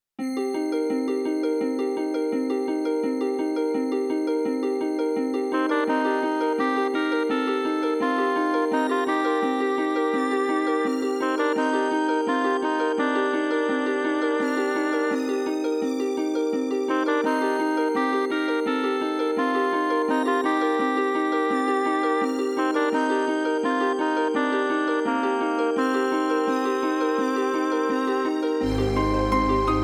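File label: Dungeon, Roland (pro mode) piano; rpg maker xp; SC-7; MIDI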